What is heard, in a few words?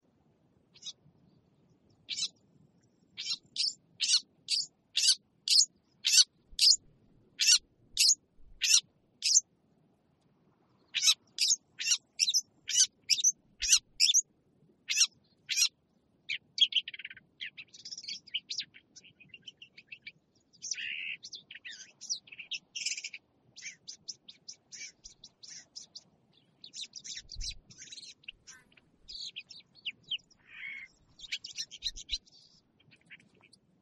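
lesser grey shrike voice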